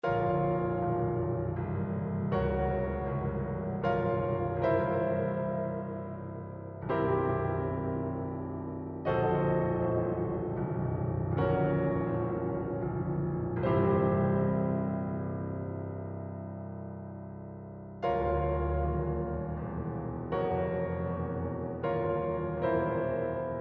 Darklord Piano; Infinity Blade; android

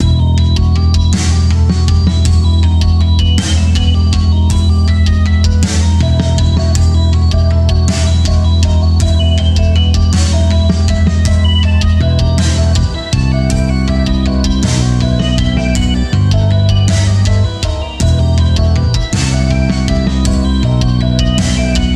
intro inst; Get in the Car, Loser

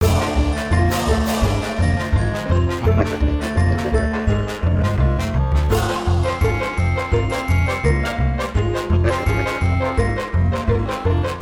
Frantic Sound; zombie wranglers; XBLA; Xbox 360

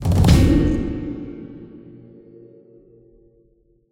combat end

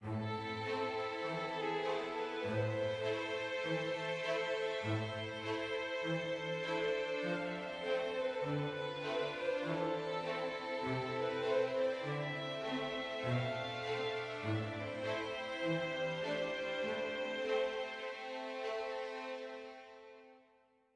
adventure med

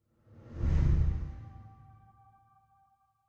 Desert SFX, Rock Rise Whoosh

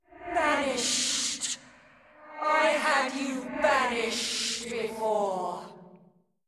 Light Noise Smoke

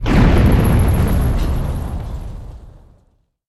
Rumble Stop

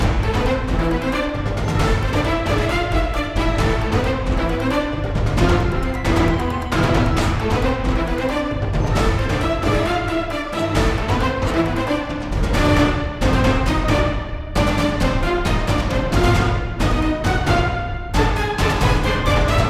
Fish Boss; Shadow Bug

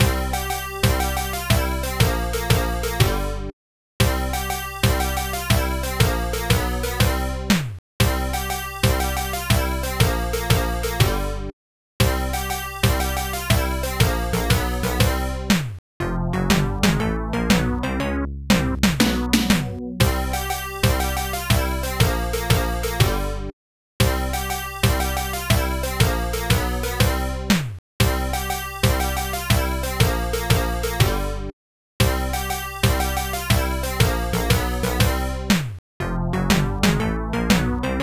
Dialogue; Crayon Shin-chan Arashi wo Yobu Enji; Genesis

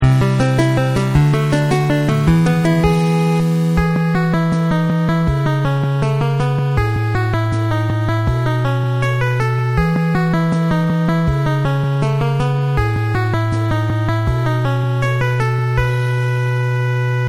Complete; Squareboy vs. Bullies Arena Edition; 3DS, PS Vita, PS4, Switch, Windows

